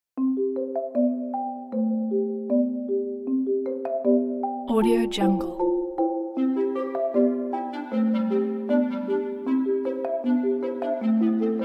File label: kids cartoon